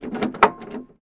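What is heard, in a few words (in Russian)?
металлический лязг дверного замка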